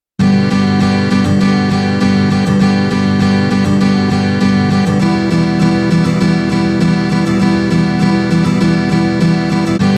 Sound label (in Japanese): 16ビート, ギター; 16ビート②; ギター講師が教える初心者用16ビートストローク